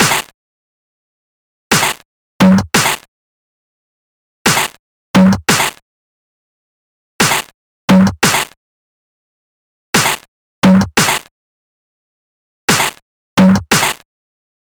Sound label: 175BPM Techy